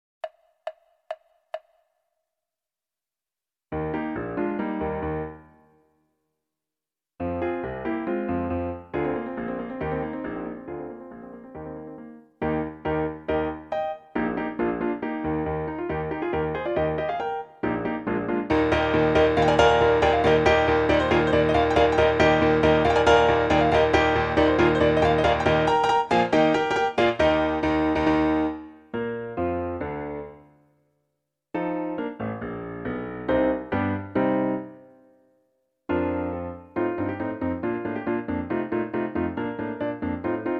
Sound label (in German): trompetenkonzert; hummel